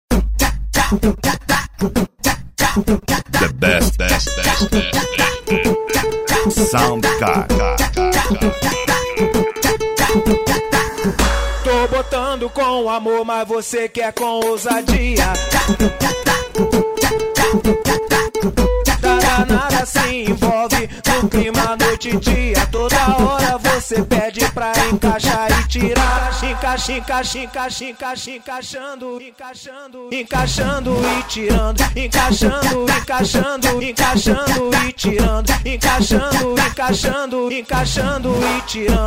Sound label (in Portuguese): funk rio; the best sond car